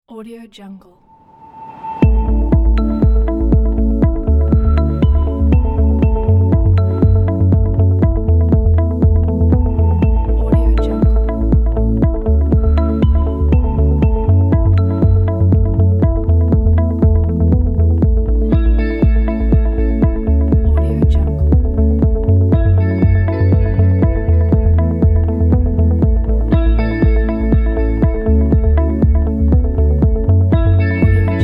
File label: inspiring corporate background